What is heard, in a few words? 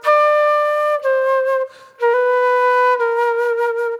Soul Plate Flute